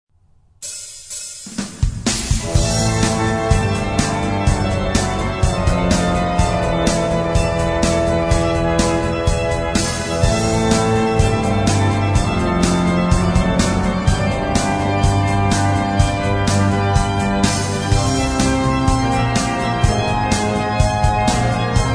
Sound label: Toccata